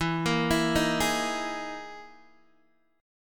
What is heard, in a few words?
E Major 7th Flat 5th E down Guitar DADGAD